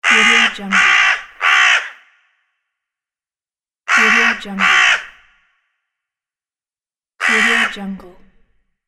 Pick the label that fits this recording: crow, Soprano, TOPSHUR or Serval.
crow